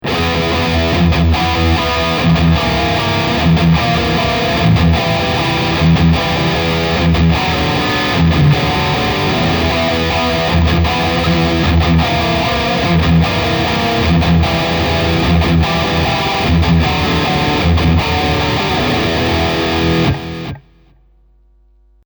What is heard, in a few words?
Bridge Lead